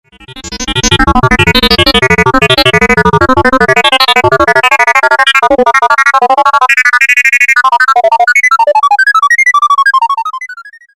Simple Lin FM

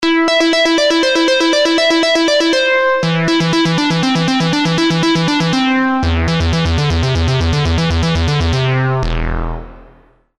synthlead

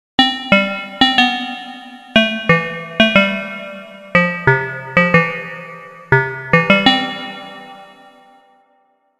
Bell, synthlead; darkplanet

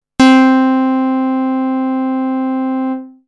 Basic Pulse, synthlead

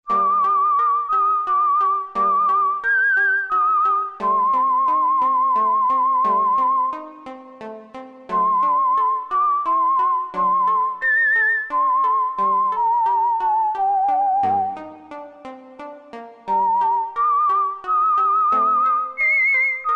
Whistle siciliano

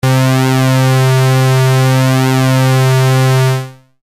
Pwm waver, synthlead

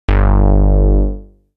Plasma-Bass 098Hz

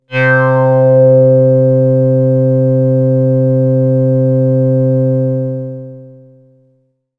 Sun-Sigh C, synthpad

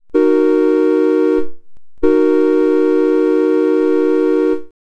Train Horn

